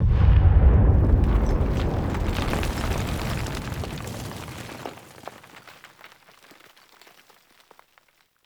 Battle Ambient Tunnel Rumble; Warframe; Tau

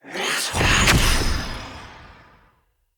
Staff Cast Long; Weapons; Enemy; Staves; Mnd Wazard Staff

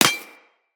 Laser Impact Metal; Weapons; Bullet Impacts; Surface

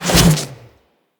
Melee Jump Slam Large Charge; Whooshes

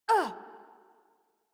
Generic Short Pain Operator Voice; Warframe; Operator Mission

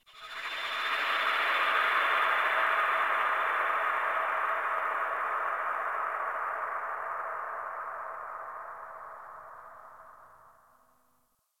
Space One Off, Ambience Exterior; Warframe; Tenno; Sector Wars

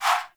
Fast Travel Map Close; Open World; Warframe

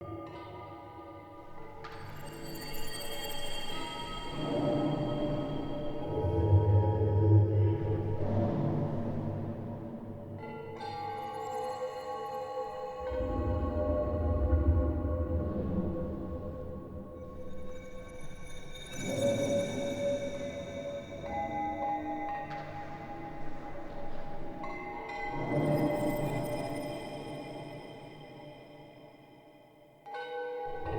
Trippy Loop; Cinematics; Bromius; Bromius Quest Wake Up Loop

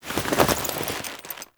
Move Foley Long; Characters; Warframe; The New War; Veso

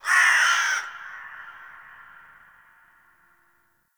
Forest Creature Vulture, Ambience; Warframe; Grineer

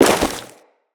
Crewman Step Ice, Footsteps; Corpus

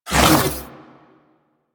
Ice Weapon Beam Retract; Spec Ops Ice Moa; Warframe; Corpus; Corpus Railjack

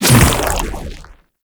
Shadow Mace Whoosh; Warframe; Weapons; Shadowgrapher

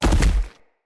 Stag Gallop Vegetation, Footsteps; Animals; Foley

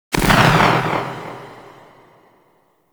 Arch Grn Skiff Drone Close Explo, Explosions; Warframe; Archwing

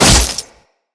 Condemn Spectre Impact; Warframes; Priest

